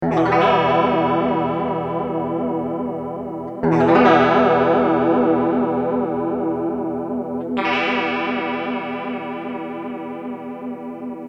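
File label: clean electric guitar jet flanger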